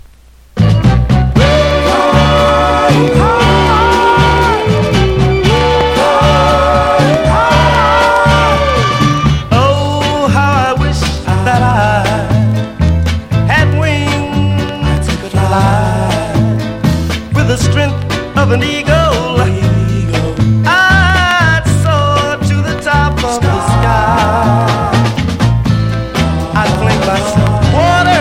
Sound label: whispers